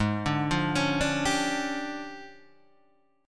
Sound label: D Minor Major 7th Double Flat 5th, G sharp